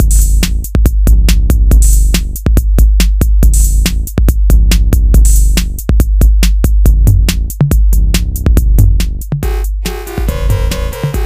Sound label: Retro rave